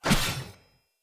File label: Short Sword Whoosh Heavy D; Weapons; Enemy; Swords; SinecureShortSword